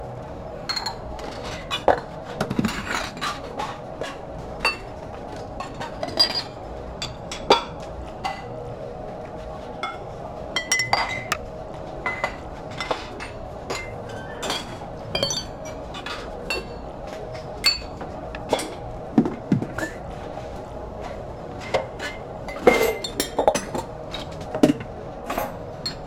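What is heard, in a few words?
subtle bar activity ambience, pub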